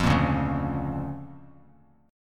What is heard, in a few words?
D Minor Major 7th Sharp 5th, D, Bass Standard; DmM7#5 strummed; JGuitar